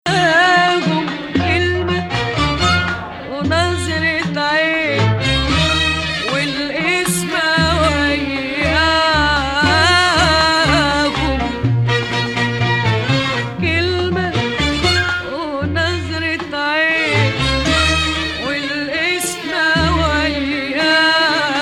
Rast, maqam

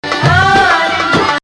Nahawand, maqam

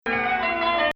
Rast Octave, maqam

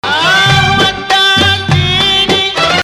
2nd Rast, maqam

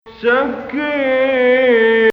Nahawand, maqam

